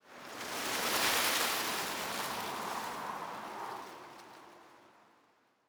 tree; ambient; Counter Strike Model Pack; CS:GO; survival

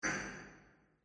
warehouse brick drop; Half Life Alyx; rocks

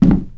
wood box footstep; physics, legacy

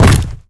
land, footsteps cardboard

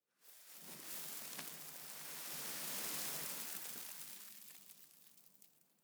sand gust; dust2

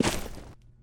footsteps, grass; player

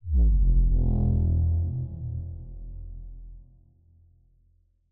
bass; music

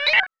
sphere blips md; npc; Portal 2

